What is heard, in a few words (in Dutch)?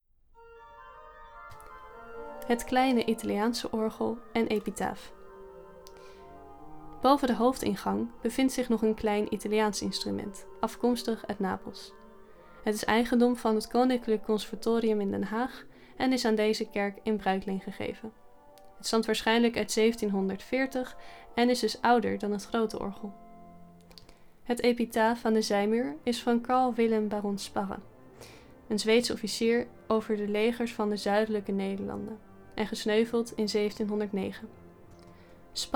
Italiaanse orgel; Sparre